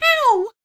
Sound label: ouch; dolls House